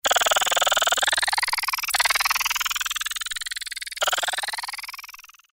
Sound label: FX Rise, Roland Juno-106; Factory Preset